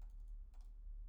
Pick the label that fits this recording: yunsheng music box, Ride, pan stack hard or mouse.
mouse